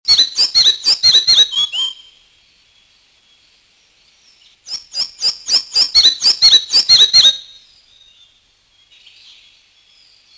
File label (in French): gobemouche